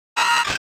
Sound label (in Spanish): Grito de Wurmple